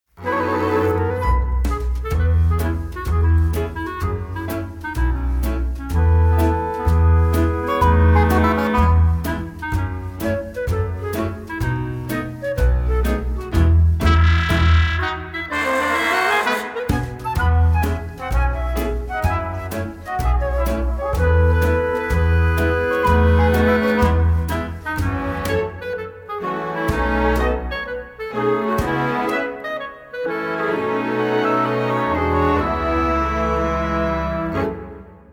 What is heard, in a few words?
Backing